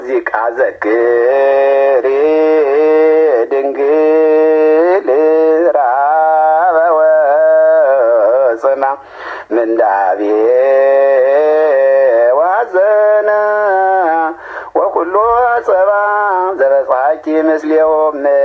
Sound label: azekeri dingel, zema; zema timehert bet; mahlet yared; ame 6 hidar; kuskuam